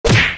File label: hit one